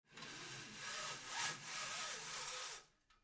Household, Blinds, Plastic, Blinds Being Released And Dropping Down To Cover Window; Bedroom Sound Effects